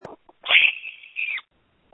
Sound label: screams